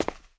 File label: concrete; firefight